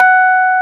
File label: E-PF-F#4